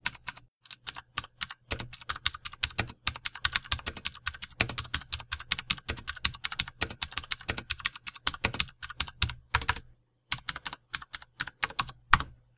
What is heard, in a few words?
Keyboard recordings Diatec FILCO Majestouch; FKBN105MC-UKB